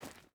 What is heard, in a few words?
footstep boots stones jump start; Rust